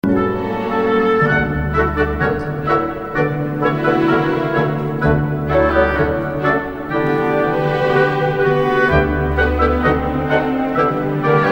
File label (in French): cigale